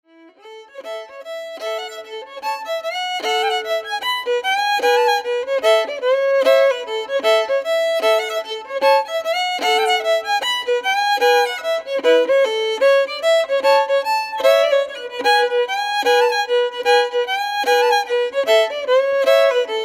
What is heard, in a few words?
Cajun Polka Set